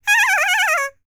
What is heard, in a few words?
BLACK SEA, World Voices; ILIO; Vocal Planet VOL-5